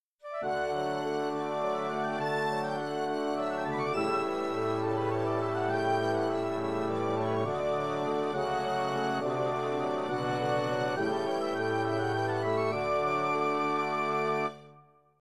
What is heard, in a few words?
melody in major